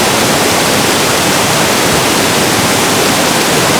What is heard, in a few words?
waterfall open source